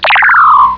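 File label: knight rider computer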